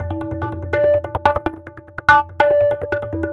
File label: TABLAS; Zero-G; Chemical Beats